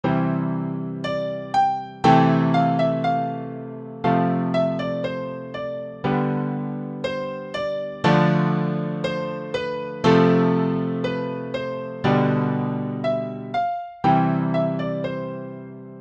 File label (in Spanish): armoonia